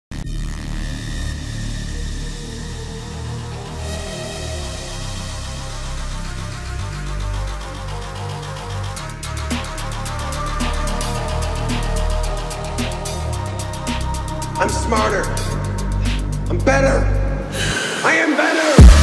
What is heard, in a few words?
Phonk; skibidi toilet music